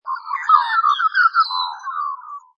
Magpies, Birds - group of magpies chattering, calling; Animals; LynneMusic